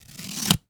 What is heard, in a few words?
Cutting Cardboard with Scissors; Office Supplies